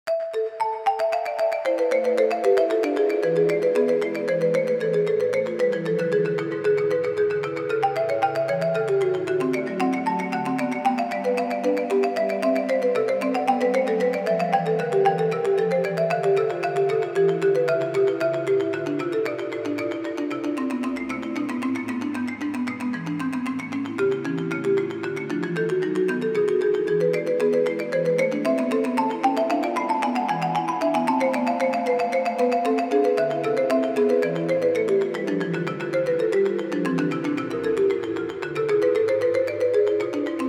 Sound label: Bach English Suite No2 Prelude